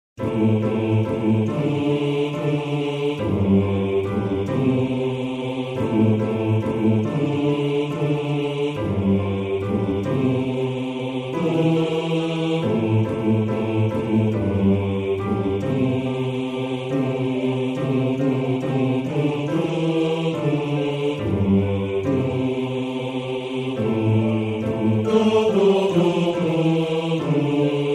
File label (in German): Bass; Lobet den Herren; Bass 2